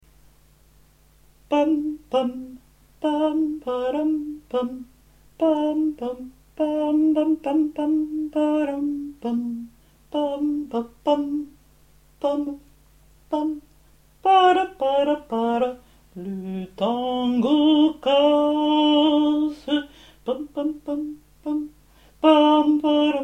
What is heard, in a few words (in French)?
le tango corse alti